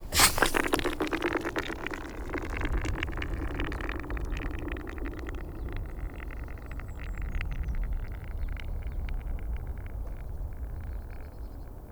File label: Ice - SLIDE - Frozen Lake Surface - Throw Ice Pieces - Scatter; MKH8060